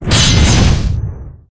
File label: sword